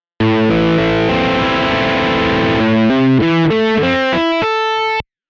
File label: Broken Chords; guitarist